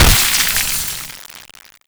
electric, player, damage

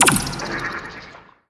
impact, physics, vault

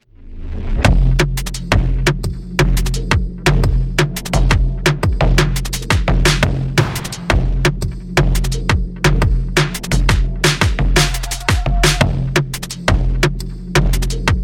start action, music

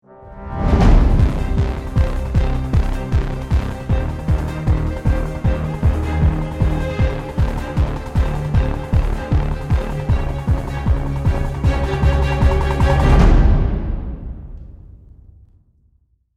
bomb ten sec count; music

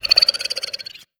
smoke whistle, headcrab reviver; creatures; vox

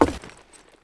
footsteps, wood panel; player

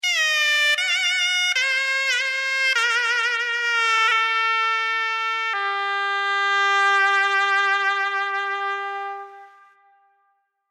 lost round, music; perfectworld

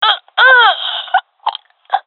death, gendarmerie fem; vo; agents

